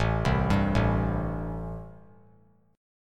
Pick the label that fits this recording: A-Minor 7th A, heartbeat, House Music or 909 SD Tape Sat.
A-Minor 7th A